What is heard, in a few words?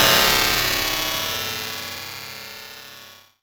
Noise